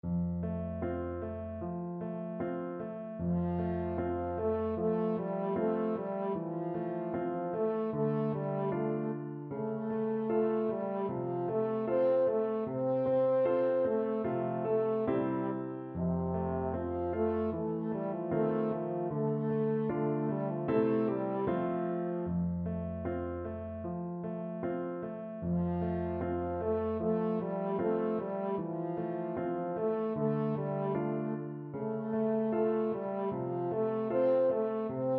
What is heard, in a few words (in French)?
a la claire fontaine, french horn